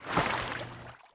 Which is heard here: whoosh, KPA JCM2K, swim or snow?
swim